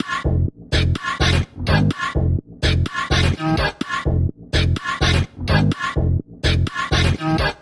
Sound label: 126 F COMBI GROOVE, funky; Loopmasters; Funky Underground Vol 2; COMBI GROOVES